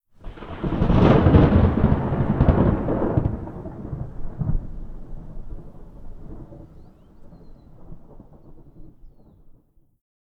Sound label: Nature, Ambience